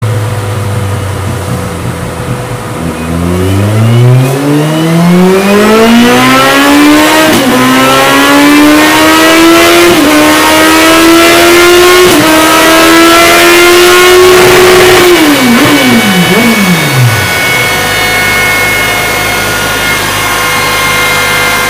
leo vince oval evoii slip on exhaust kawasaki zx14; 2008-2011